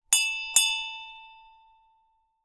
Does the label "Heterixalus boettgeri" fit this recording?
No